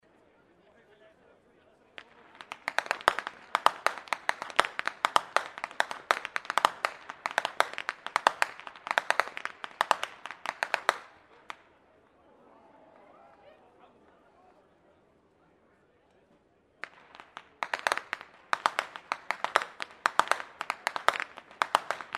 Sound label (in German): schnalzen, jugend; waging